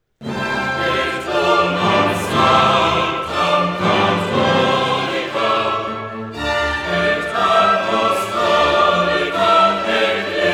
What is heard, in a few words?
Credo Et unam sanctam